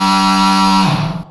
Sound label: CYBER KIT, DRUMS